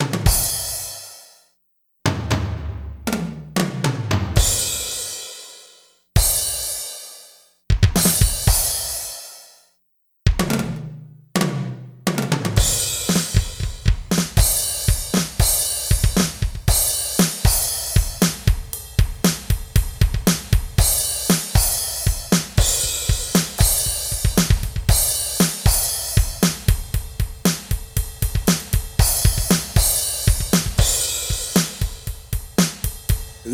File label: vocals lead; music smorgasbord